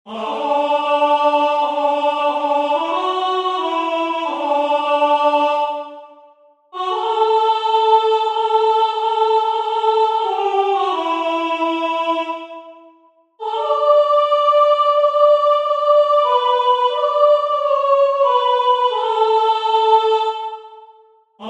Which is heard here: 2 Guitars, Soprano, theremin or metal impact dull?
Soprano